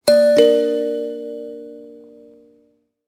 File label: ding dong melodic doorbell; soft doorbell